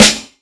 crisp steel snare drum sample g sharp key; snare drum one shots

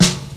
raw snare b key

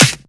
natural clap single shot c key; single hits one shots

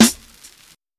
big room acoustic snare sound a key

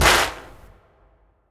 long tail reverb hand clap sample f key; percussion; single hits one shots

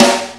snare drum sound c sharp key; Duration 0:01; Mono; snare drum samples

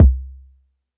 huge kickdrum sample c key

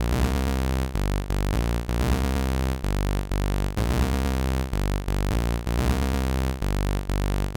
electric techno bass; loops

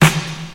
steel snare drum D# key; snare drum samples

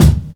good kick sound d sharp key; kick drum; one shot

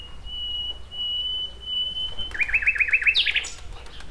blackbird flapping wings; soundscapes

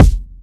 punchy kickdrum f key; tuned drums f key

